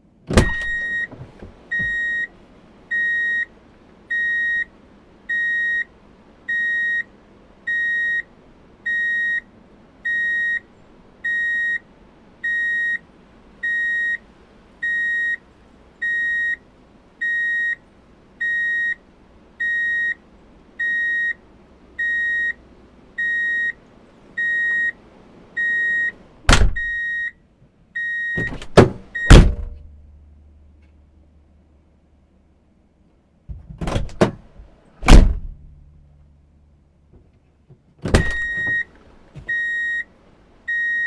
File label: drive in reverse beep alert